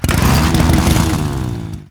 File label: Infested Hammer Accent Rev, Weapons